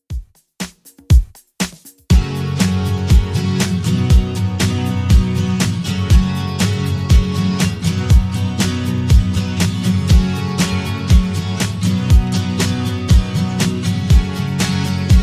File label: 70s, 120bpm